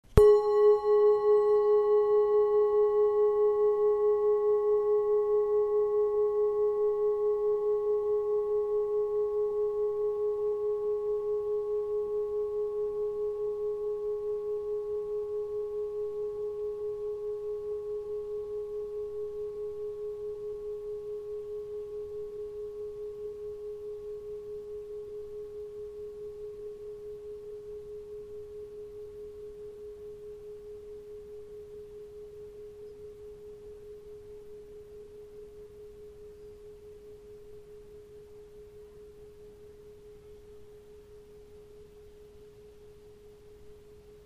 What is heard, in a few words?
planet bowl; planet bowl neptun